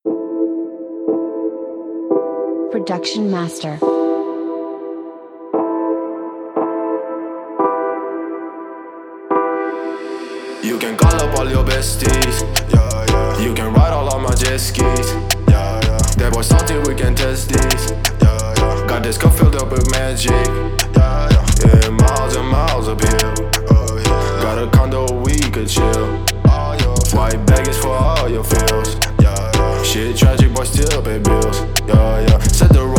Mainstream Trap Modern Hiphop; Liberty Blood; hihat

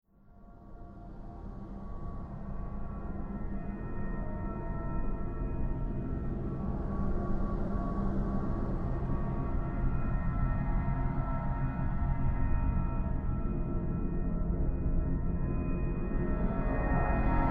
OB6 Bass Soundscapes

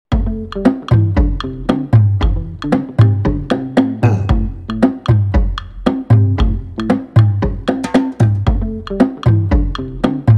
Cowbells Blocks; Black Octopus Sound; Mutombo; Basement Freaks; disco

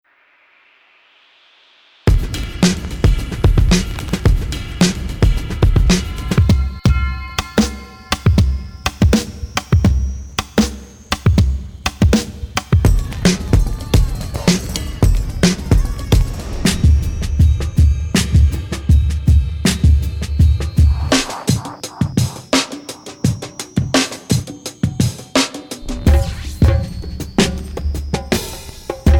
Golden Breaks; Black Octopus Sound; 1K Golden Breaks; Neighborhood Production

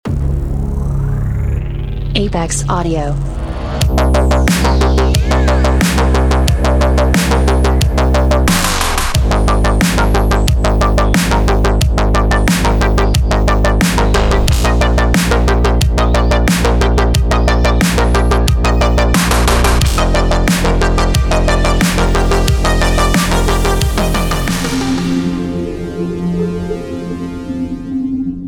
dark synthwave

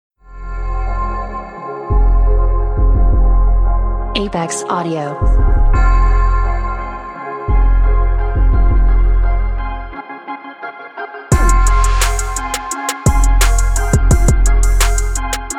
HipHop Trap

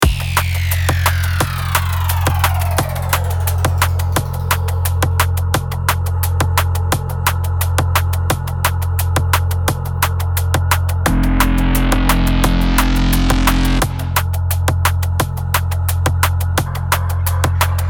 Foley Drum Bass

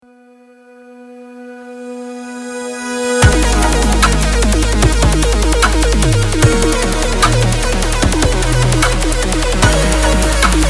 Foley Color Bass, dubstep

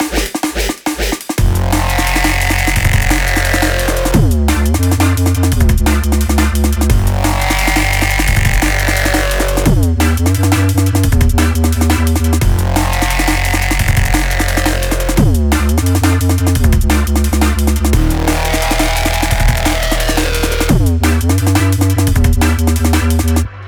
Neurodub Pigments Presets; Black Octopus Sound; ScrapKodes; Phosphorus

